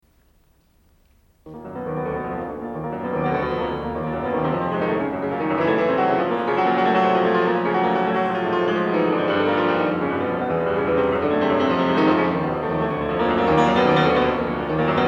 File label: Prelude In E Flat Minor; Chopin; Prelude #14; Op. 28